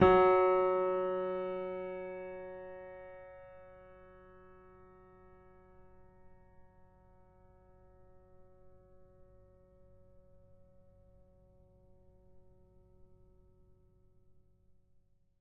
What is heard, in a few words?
F sharp 5, piano